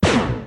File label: Se Magic fire; Se Magicfire Bd